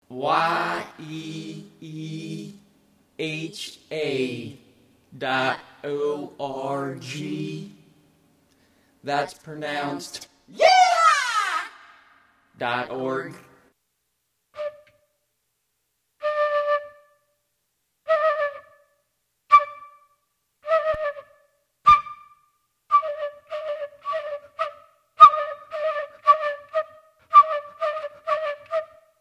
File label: flute